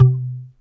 WOODY, PERCUSSION

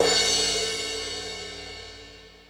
Crash, Drum Machines E-MU Pro-Cussion

